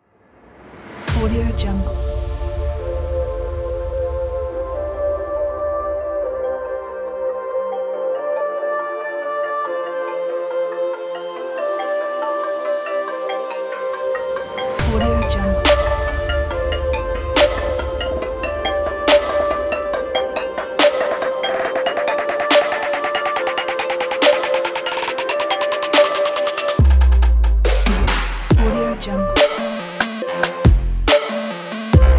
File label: teenage future bass